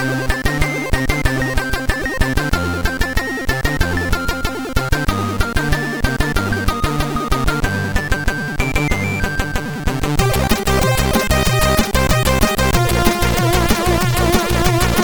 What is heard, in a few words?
Castle, MOS6581R4; hvsc